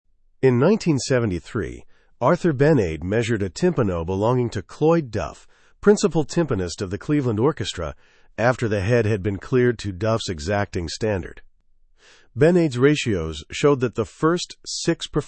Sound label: timpano mode ratios; timpani pitch